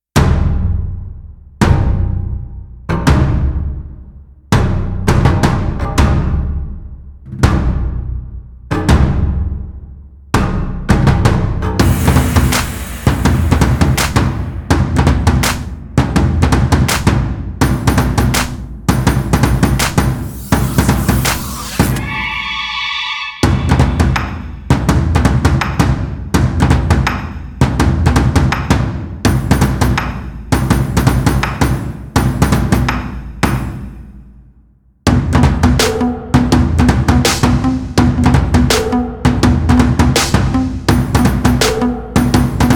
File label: Instrumental; K-POP